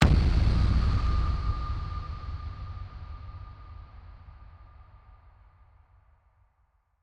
Deep Cinematic Impact

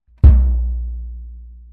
Low Drum

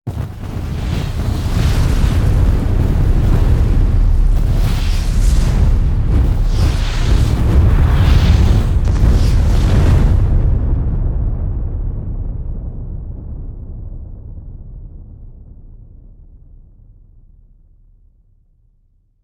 Cascade Of Explosions

